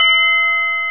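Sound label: TUB BELL C5, percussion; AKAI S-Series CD-ROM Sound Library VOL-8; SET#1 C PERC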